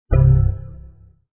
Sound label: Windows XP Battery Low